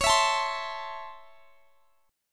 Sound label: Db Minor Major 7th Sharp 5th Db; strummed; x,x,23,21,22,20